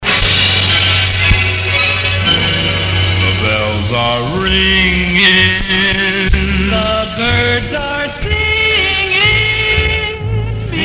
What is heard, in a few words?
jingles the bells are ringing restored; futursonic